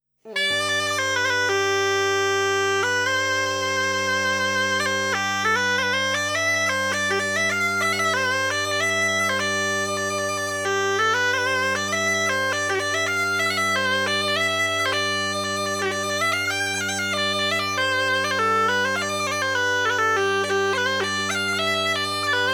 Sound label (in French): Marche; Marche de Martinot